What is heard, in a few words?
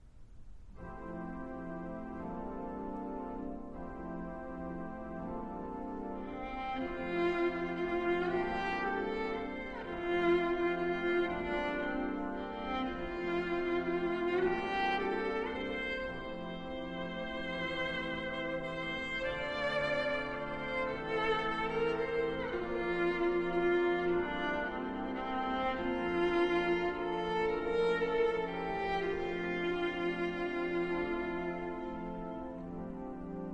Chorale Preludes; John Weaver